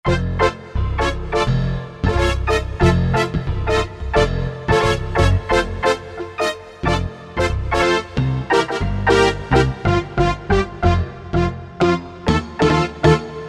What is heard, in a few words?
Brass Perc, Korg MSC-11S Brass